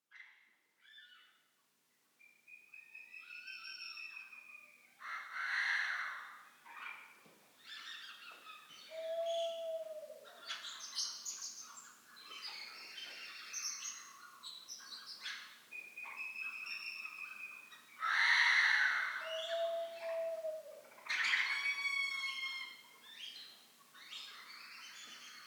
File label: Bird Song